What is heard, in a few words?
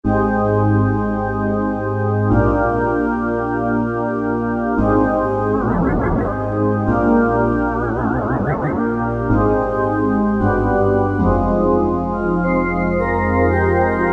Bellsynth2, Korg T3